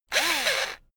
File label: electric drill screw in short; maschinen; bohrer; soundpool